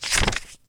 page

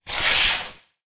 curtain

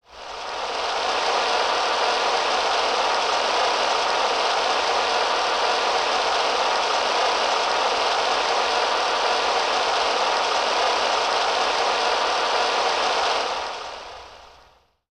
rain

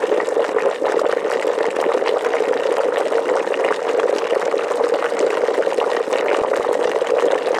boil

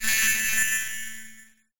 radio wave

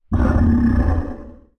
roar

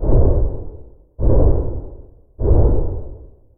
flutter

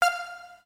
button

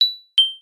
button

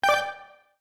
button

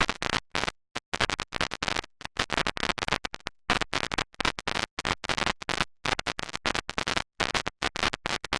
noise

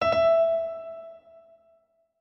button

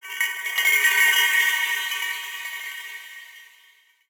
bell

